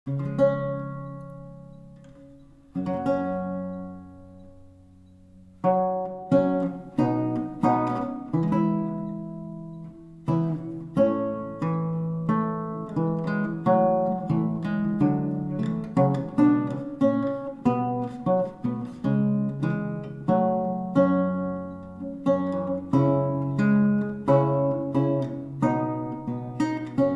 lute; jesu dulcis memoria

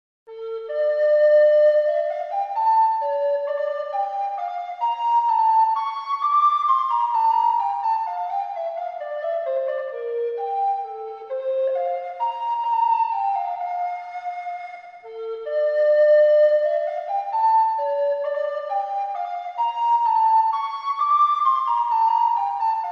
bourree; bach

